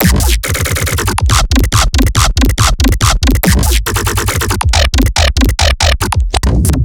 Riddim Bass Loop 140 Gnarly; Dubstep; Riddim Basslines; Ghosthack; Advent Calendar 2019 Day 17; Riddim Bass Loops

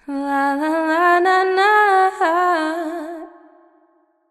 Lalanana, Female Vocals, Wet; Advent Calendar 2017 Day 1

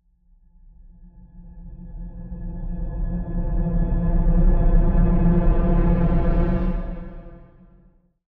Riser

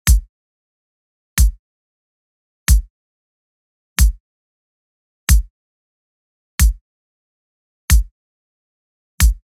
Drum loop - 95 BPM Kick, Latin; Loop Stems; Cymatics; GHOST (Beta Pack); Astro Drum Loop

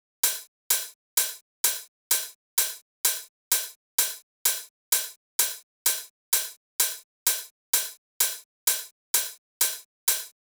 Velocity Top Loop - 128 BPM; House; Top Drum Loops; Cymatics

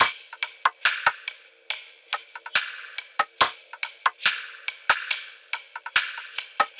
Cash Perc Loop - 141 BPM; Cymatics; Fiji Hihat Loops & Percussion

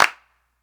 Nineties Clap; Drum Samples

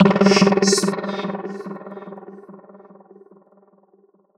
Stab G 145 BPM, Psytrance; Digi Box; Trizkel

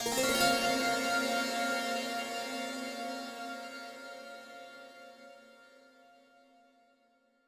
Arp Strike (Glass) - 130 BPM A# Min; FX; Cymatics; GHOST (Beta Pack)

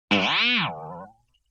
Boing Box Short Hit; Vintage Cartoon; Hits & Tones

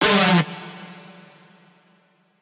synth screech oneshot D; Synth Hits; GhostHack

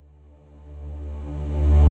Tonal Reverse, D; House; FX; Ritual Tonal Reverse

